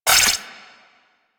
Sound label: Igniter Pistol Mech; Warframe; Weapons; Corpus